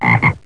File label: Frog; With Open Eyes - The Art Institute of Chicago